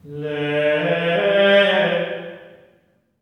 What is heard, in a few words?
GR ALLELU, Symphony of Voices; Spectrasonics